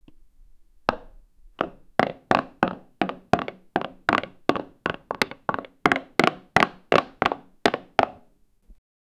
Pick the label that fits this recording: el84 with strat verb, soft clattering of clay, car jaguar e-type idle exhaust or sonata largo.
soft clattering of clay